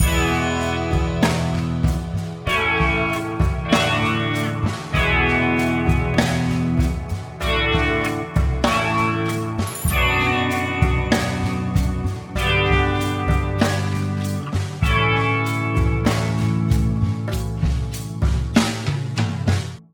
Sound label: Attack-Delay